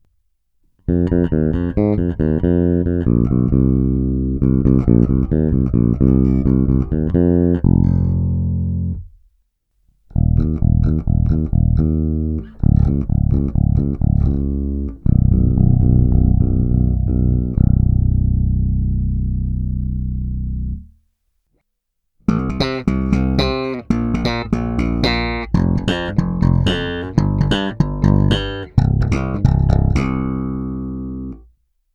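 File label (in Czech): Použití struny H pasivně, Baskytara; Aleš Vychodil AVBS5; Ukázka prsty, struny H a slapu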